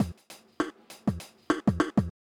drum fill; Multitrack

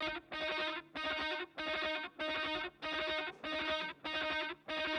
Guitar Outro Delay Loop

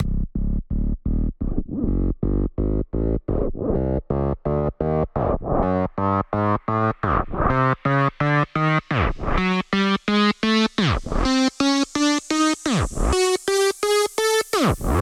128BPM Rise Up 8bars; Shockwave - Fx Elements vol 1

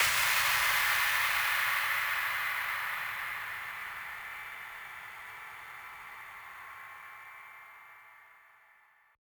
128BPM Kit solo; Shockwave(PIL) - Future Big Room vol 2; Massive Kit

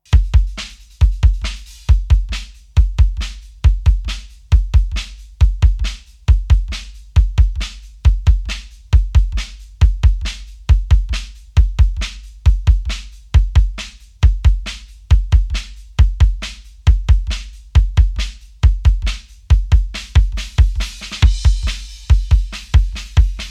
drums

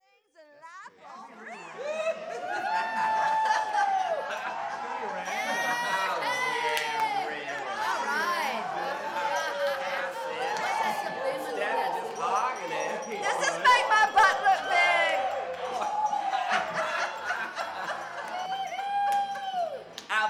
Party Track; Effects

